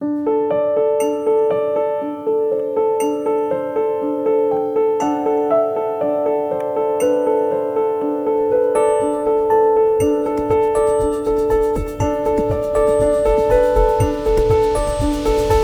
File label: information news